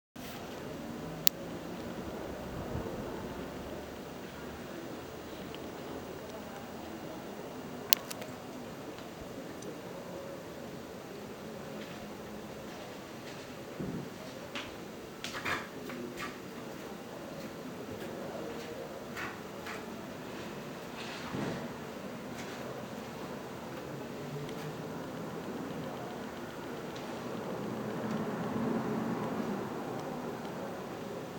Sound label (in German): Fenster, fieldrecording; Wolken; Mischpult stereo; Original Video Soundtrack (roh, ungeschnitten)